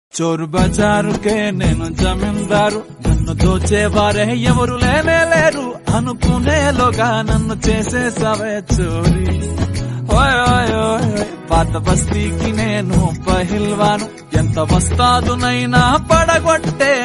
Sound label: telugu song ringtone; chor bazaar